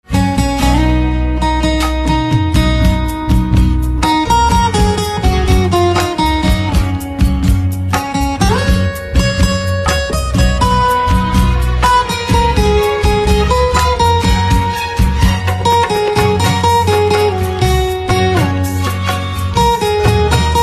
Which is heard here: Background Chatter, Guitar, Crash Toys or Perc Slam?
Guitar